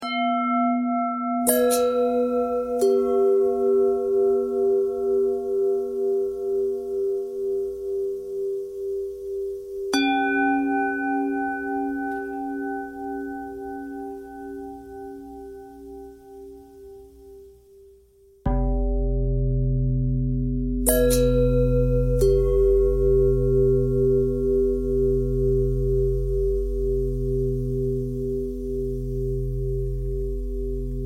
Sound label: long bell bell thread simple, bells